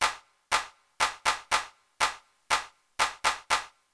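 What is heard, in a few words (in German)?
Rhythmus, Notenlänge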